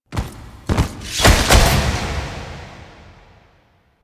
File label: Queens Chamber Reveal Guards; Warframe; The War Within